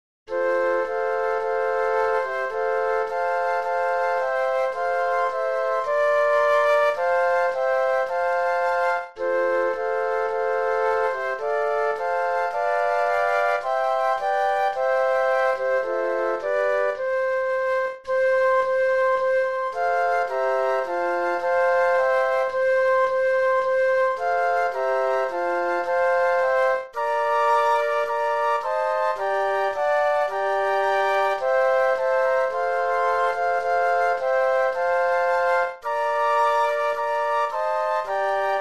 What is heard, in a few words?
Flute Quartet; christmas